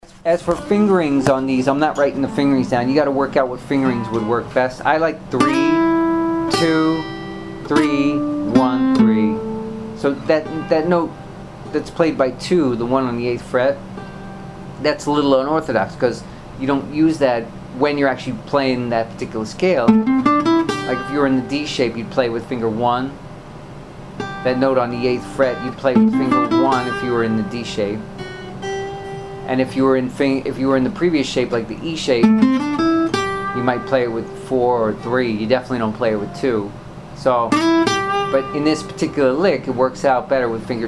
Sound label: licks using slides